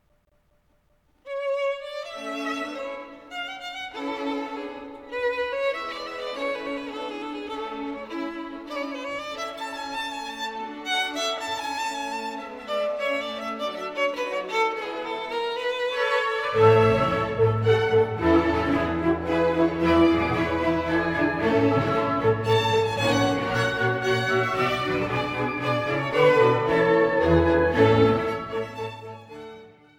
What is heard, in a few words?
Violin Con